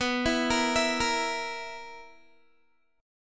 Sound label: B Major 7th Suspended 4th B down Guitar; arpeggiated